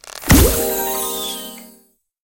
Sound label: Item Arrow; In Internal Item Arrow